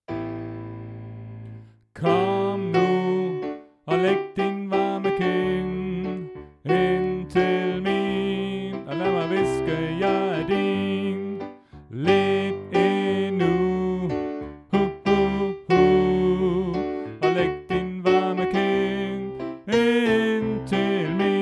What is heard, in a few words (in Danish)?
Sopran; oevefiler